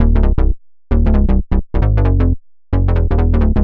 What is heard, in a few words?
Bass, uk garage, 132bpm